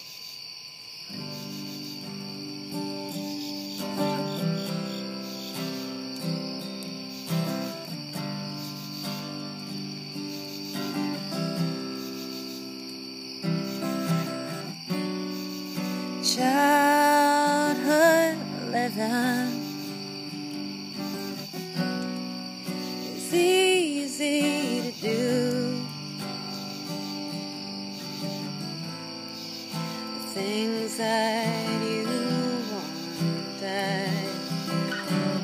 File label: Wild Horses Crickets